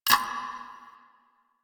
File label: Sticky Bomb Detonate; Grineer; Veilbreaker; Vor